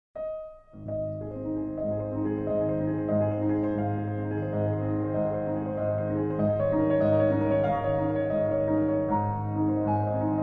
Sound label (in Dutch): muziek